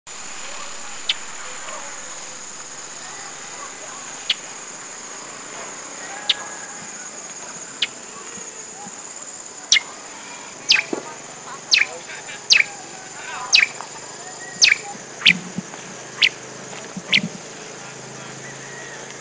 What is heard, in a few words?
Tawny Grassbird Makiling, Philippines; Tawny Grassbird Megalurus timoriensis